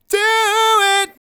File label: Do It, Male Vocals